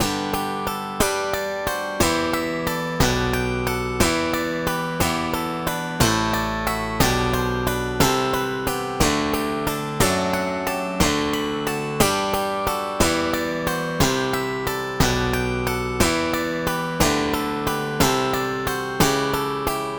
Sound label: pop bass